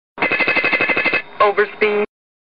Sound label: MD 80 Overspeed